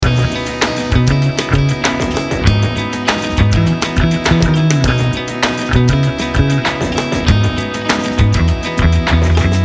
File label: Hip-Hop Pop Rock Loop